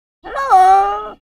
Macaw Vocalizations. A Macaw Says Hello. Close Perspective. Bird